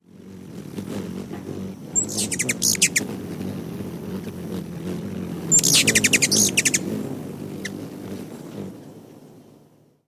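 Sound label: RUTHHU chase calls, ruby-throated hummingbird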